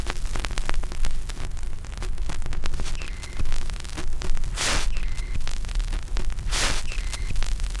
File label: CRACK, CRACKL FX; E-Lab; X Static Goldmine 2; akai